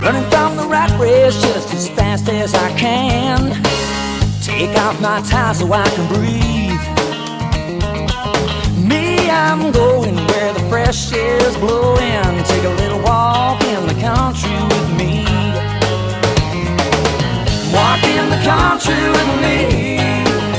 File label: Country Walking